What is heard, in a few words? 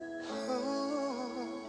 vocals